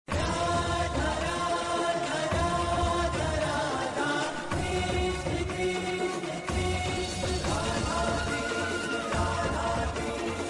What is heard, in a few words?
Bhakti Ringtone